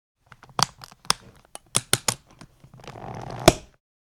Ski boots put on

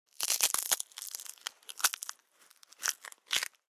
Bones break juicy and disgusting

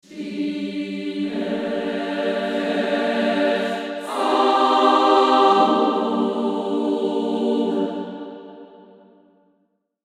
Hollywood choirs female closemic

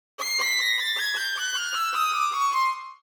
Cartoon violin scale

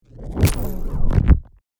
Energy particles whoosh

